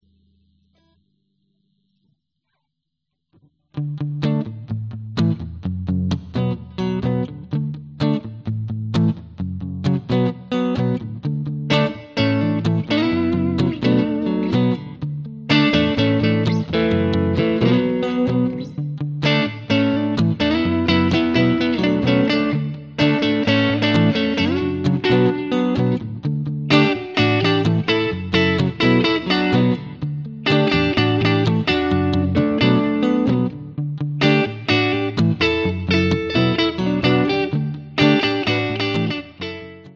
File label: Songs For Guitar